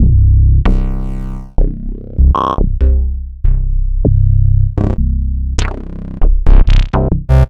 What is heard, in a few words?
Bass Hits